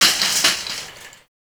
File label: Shopping Cart Hit